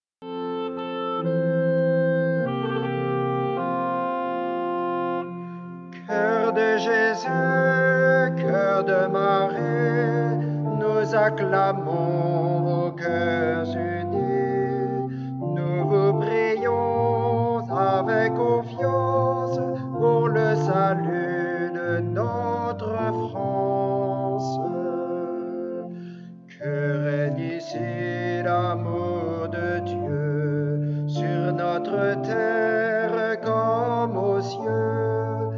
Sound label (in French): CHANT